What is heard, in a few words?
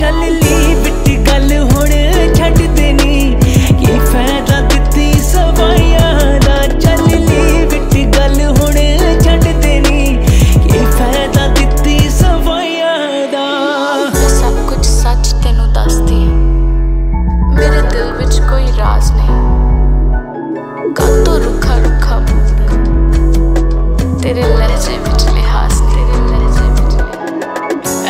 Sad Punjabi Song Ringtones